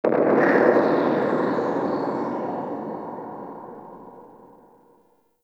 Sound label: Exterior Deep Space Booms; Ambience; Warframe; Railjack